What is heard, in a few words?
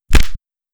punch